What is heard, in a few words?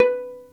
P VIOLA B4; SOLO PIZZ ST; Greytsounds VOL-1